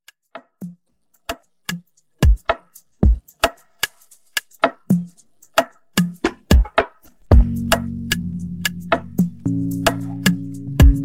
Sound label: Afro Beat Mashup